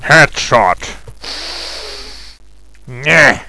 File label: headshot; dod